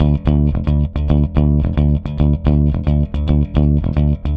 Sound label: Rock, Bass; Bass X